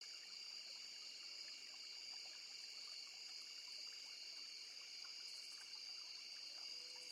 abends sommer, Die Musik ist im Country-Stil; stardew valley

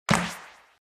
feder stark; arms

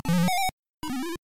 stein faellt; Dig Dug

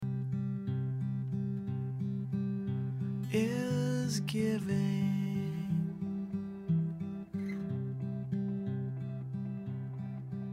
stimmungswechsel musikwechsel; Life is Strange 2; gamesoundopedia

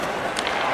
sound schuss; national hockey league 2019

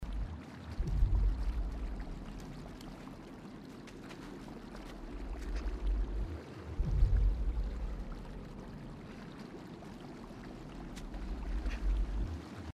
wasser hintergrund; inside

